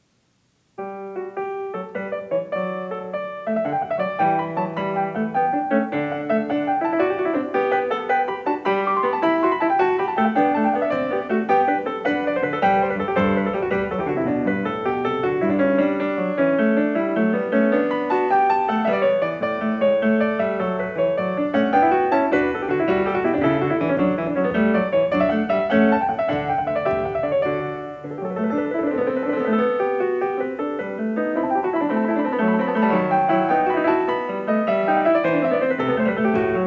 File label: Variation 24 Canone all' Ottava a 1 Clav.; Bach; The Goldberg Variations